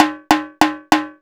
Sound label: Timbale Strike